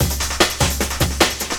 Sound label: LOOP, Drum & Bass, 150 BPM